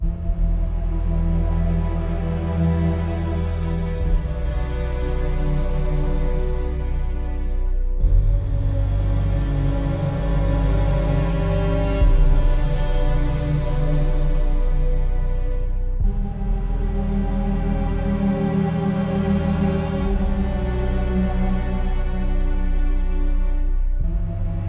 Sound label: Bereavement Ambient